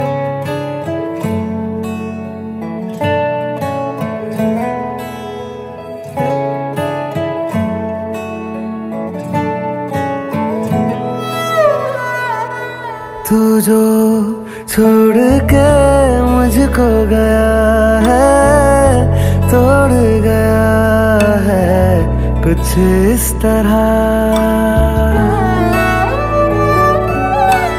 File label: music ringtone bollywood ringtones